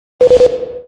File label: drone disarm; Weapons; EK modern; Barotrauma